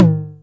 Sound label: 909 Hi Tom; DRUM MACHINES; 909 Extended